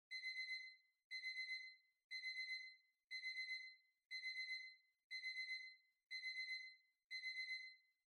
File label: Kitchen Timer Far Alarm